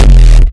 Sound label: Kick; Zaag; Raw-Hardstyle Kick Pack Vol.1